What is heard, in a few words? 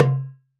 Tabla Dom; Roland BK-5; Percussion